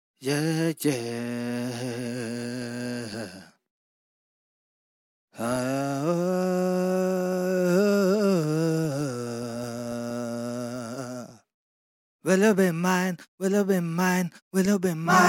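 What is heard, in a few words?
acapella, HH Pop RnB 105 F sharp minor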